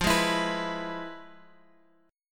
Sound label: F Minor Major 7th Flat 5th Guitar Open G